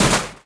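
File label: metal collapse medium; objects; Star Wars Obi-Wan; XBOX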